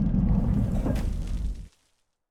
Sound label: stone door